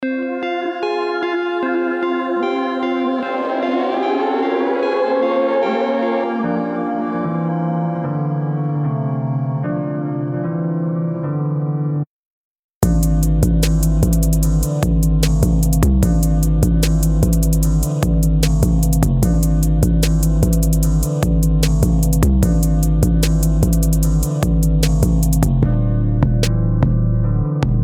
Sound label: Beat; Bridge Music Winter 2018